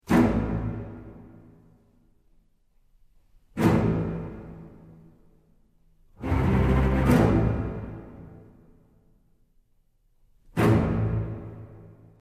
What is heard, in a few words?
Zarb; Vocal&Effect